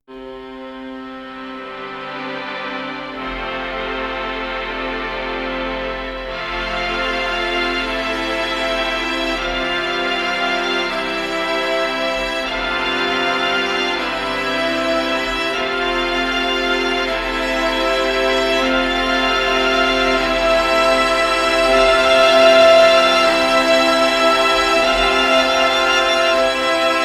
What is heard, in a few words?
Strings